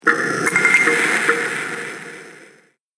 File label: Strange Resonant Hits; Miscellaneous Impact; Andrew Fleming